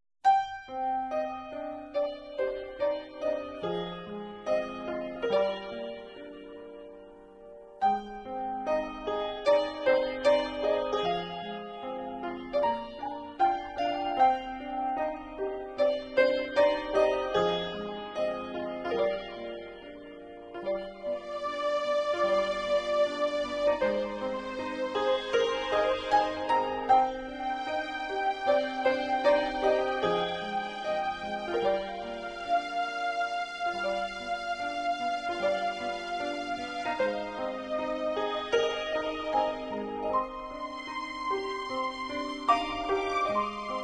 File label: Soft Interlude; Greek